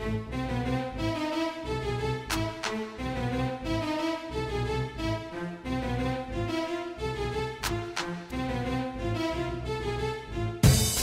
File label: Essai Rnb